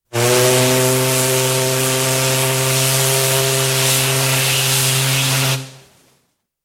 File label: Ships Sirens, Paddle Steamer, hooter sounded; Boat; BBC